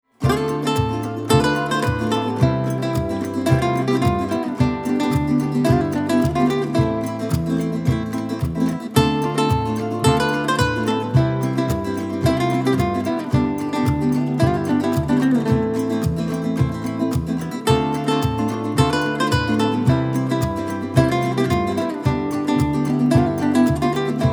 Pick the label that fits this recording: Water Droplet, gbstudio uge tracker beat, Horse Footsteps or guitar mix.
guitar mix